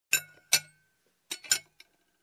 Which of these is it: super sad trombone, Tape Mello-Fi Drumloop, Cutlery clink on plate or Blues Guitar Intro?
Cutlery clink on plate